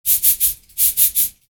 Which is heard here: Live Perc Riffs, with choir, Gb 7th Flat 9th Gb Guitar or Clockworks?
Live Perc Riffs